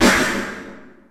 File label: KOMMERZDRUMS